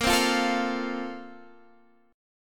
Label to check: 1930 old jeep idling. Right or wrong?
Wrong